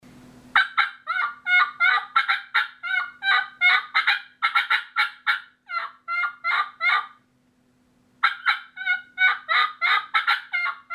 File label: Excited Hen Yelping And Cuts, turkey